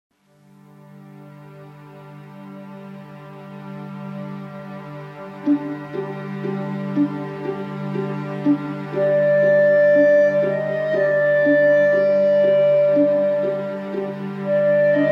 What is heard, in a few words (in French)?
cordes et clarinette; petite fille